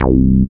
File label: SYN TB303, Roland; Sound & Vision - Gigapack I CD 2